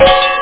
kochtopf

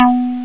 xylophon, synth; da capo vol1

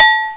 Glas

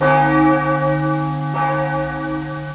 Kirche; PC Gamer IT CD 48; 1602 AD; 1602ad demo

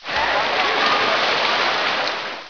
Badehaus; PC Gamer IT CD 48; 1602 AD; 1602ad demo

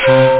metallfloete; bobo

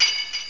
klirr; Groovy Bytes: Behind the Moon; BAS PERC

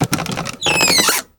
Tuer

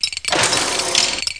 Fisch Auf

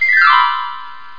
kling upp

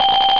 eieruhr

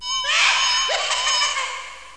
Schrank

